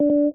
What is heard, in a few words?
error, sounds; dragonpilot; selfdrive